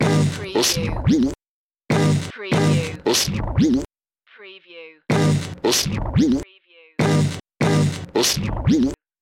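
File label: Scratch Bump 95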